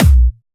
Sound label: Trance Kicks